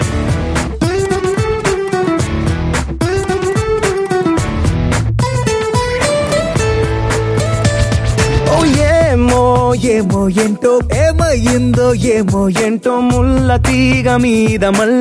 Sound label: Ringtone